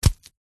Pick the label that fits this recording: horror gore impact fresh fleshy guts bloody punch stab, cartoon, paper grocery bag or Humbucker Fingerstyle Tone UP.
horror gore impact fresh fleshy guts bloody punch stab